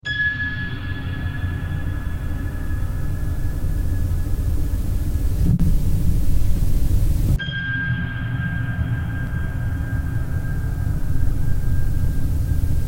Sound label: ambient piano